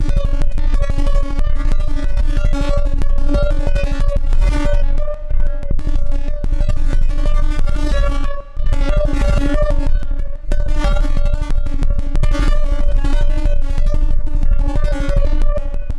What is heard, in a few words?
kick explorations